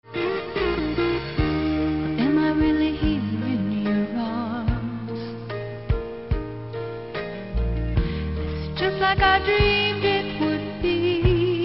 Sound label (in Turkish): santral fon muzigi